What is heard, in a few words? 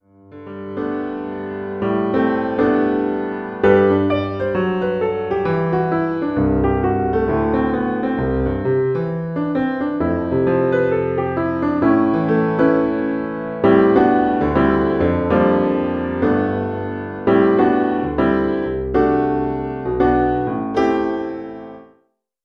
intermediate piano solo